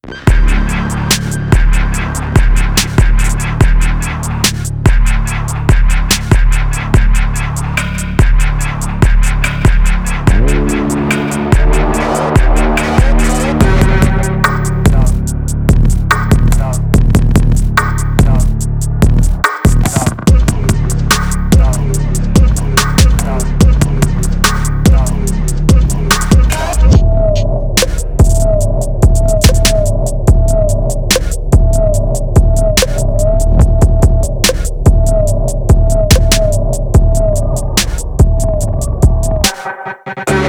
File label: DrumVocal Kit; Splice; Mick Schultz; Paragon Kit Vol. 1